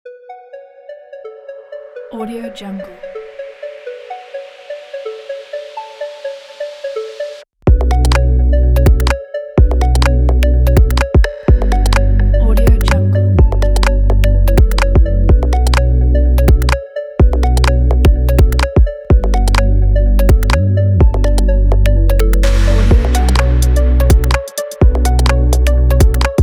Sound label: background abstract tech